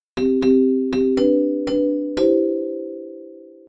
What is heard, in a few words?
Jingle